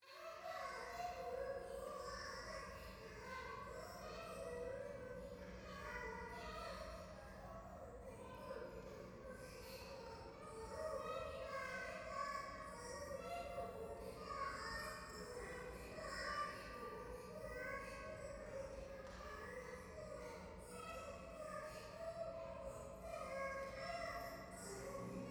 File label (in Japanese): 可愛い喘ぎ 電車; 夢の中